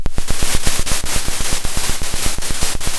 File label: Sound of a drum roll